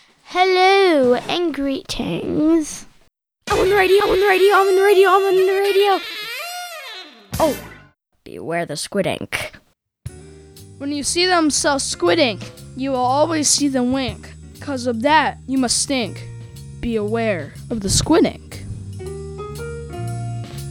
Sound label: Word Jazz Ensemble; Camp Quest Northwest; CQNW